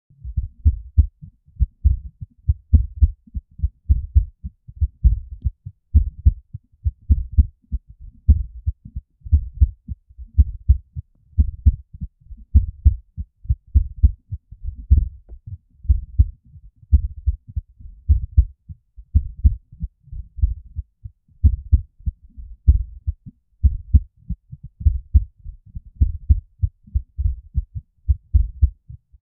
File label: a heartbeat